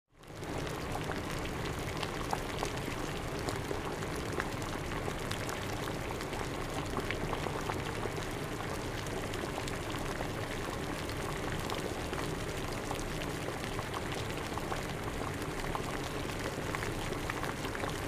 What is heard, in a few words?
boiling water